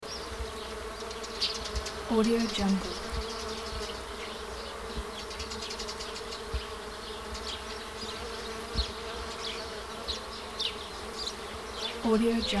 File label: bees pollinate flowers